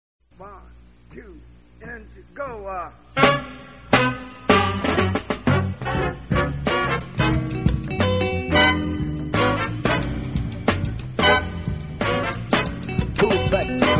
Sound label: 70s funk